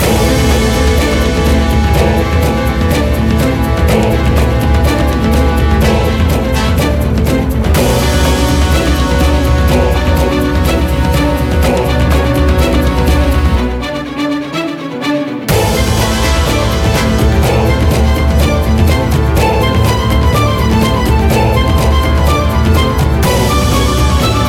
TENSION LOOPABLE

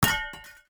glass hit

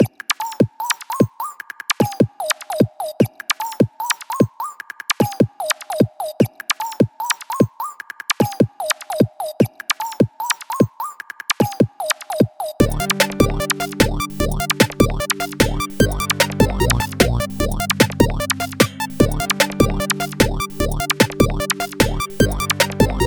Instrumental; Friday Night Funkin G-Sides

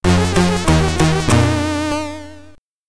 Game Over (SID Stereo); magic land dizzy; commodore 64

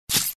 Sfx Enemy Murloc Attack

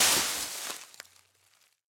foliage hit

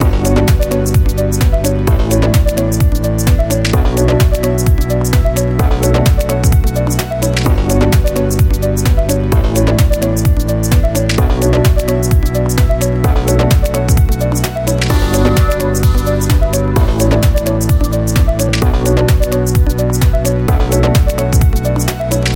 main menu music; Equalizer

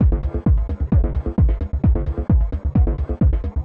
traffic car music loop; Street Legal Racing Redline

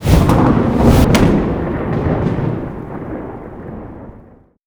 Special Thors Hammer; LooterKings

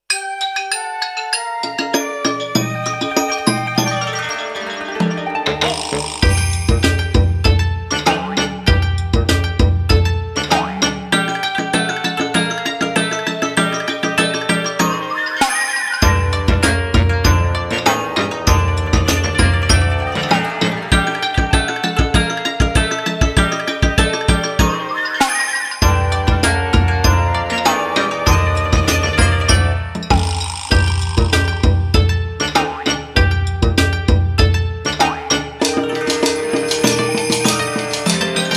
Sakura Folk Song; Taiko no Tatsujin Portable 2; PSP

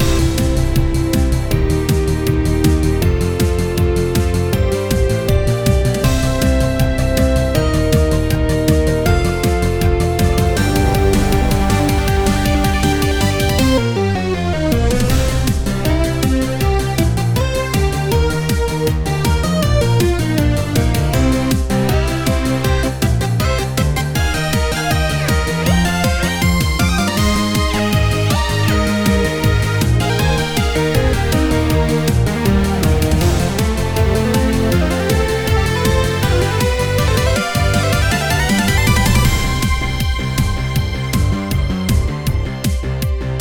Party Mode

